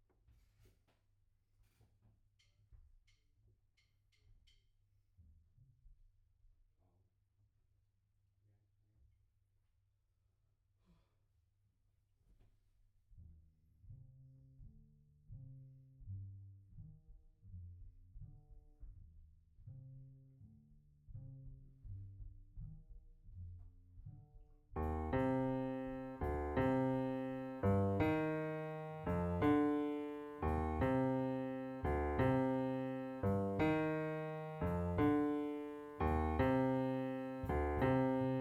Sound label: Piano B